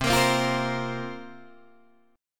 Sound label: C 7th Suspended 4th, Guitar, DADGAD